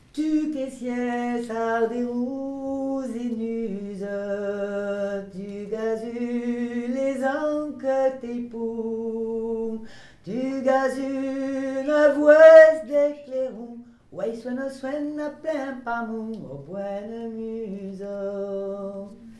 VOIX

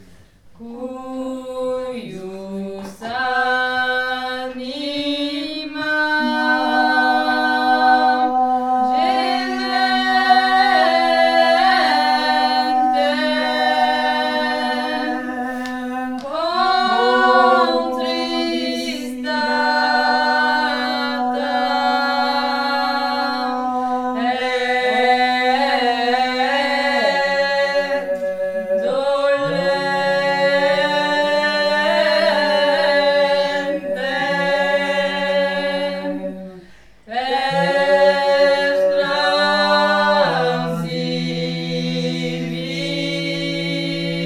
Stabat Mater trio

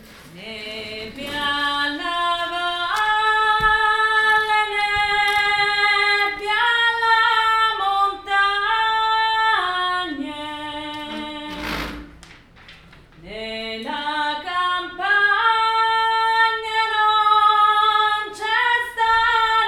TRIO; Cade l uliva; St Jean

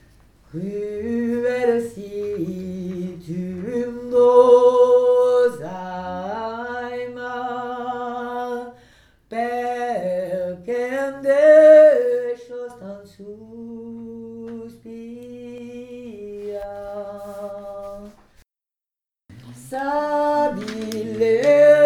Cruela VOIX; Cruela Si tum vos aimar

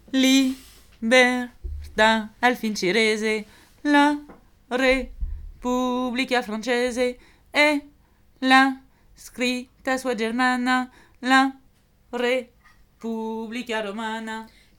ALTO; Liberte; St Jean

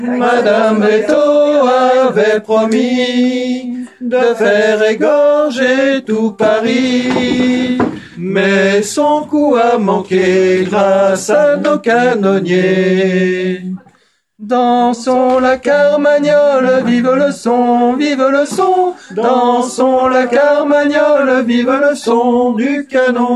TENOR; Carmagnole

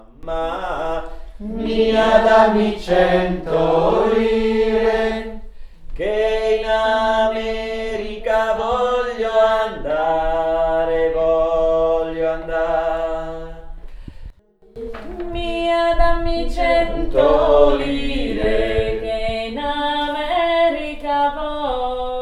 ALTO, Chansons; Mamma mia dammi cento lire

